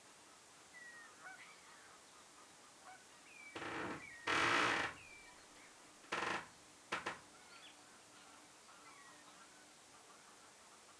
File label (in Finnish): onko tuo itaisen punajalkaviklon aantelya lattialaudat tornissa narisee